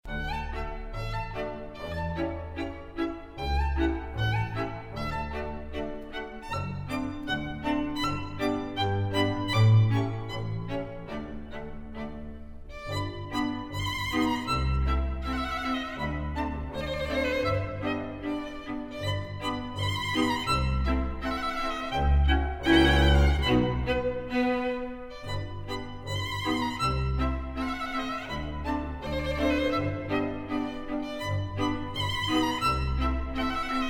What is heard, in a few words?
polka; annen